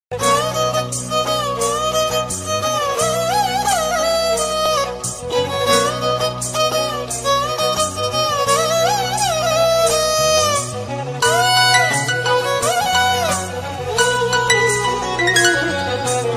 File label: love bgm ringtone violin music; Anand movie; Shekar Kammul